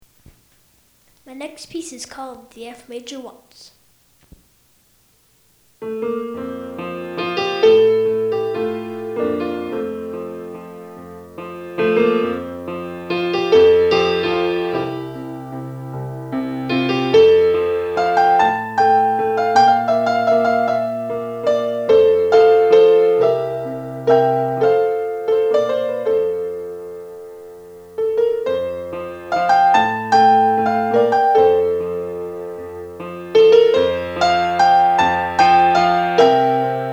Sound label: Waltz